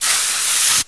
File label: sprayer